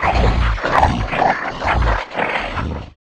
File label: eat candy